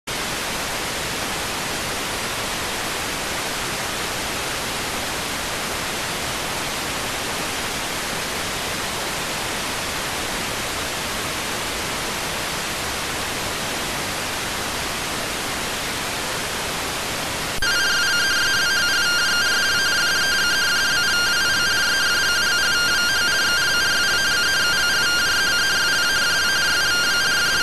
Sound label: rtty rx